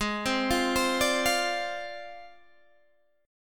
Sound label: Ab 6th Flat 5th Ab, Guitar, DADGAD